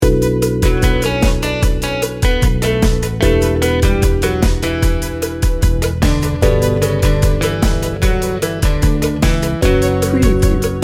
easy breezy 60 second

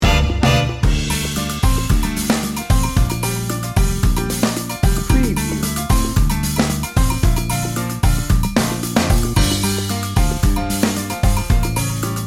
salsa montuno

